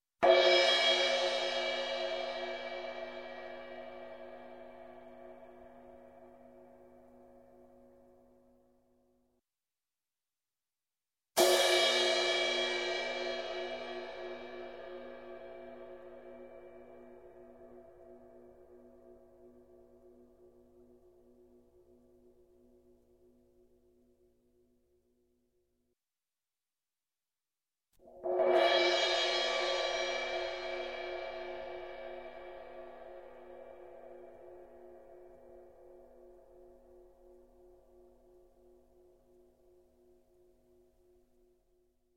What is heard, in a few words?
Cymbal large Crash, Music, Percussion; Sound Ideas; Series 2000